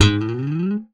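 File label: slap, bass; Xsample VOL-1; SLAP+THUMB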